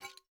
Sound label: ui pickup metal medium rattley; Rust